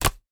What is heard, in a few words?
item drop